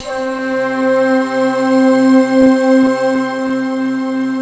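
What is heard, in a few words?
C4, JAPANESE FLUTE; Best Service; Advanced Media Trax Modern Composer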